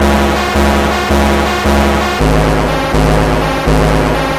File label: alarm